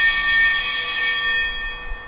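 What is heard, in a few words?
burglar alarm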